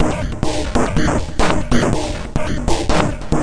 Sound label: 140 hexbeat, DRUM LOOPS and BREAKS